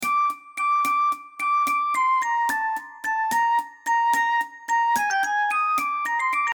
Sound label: poly upper